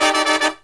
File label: LOW CHORD, Killer Brass Riffs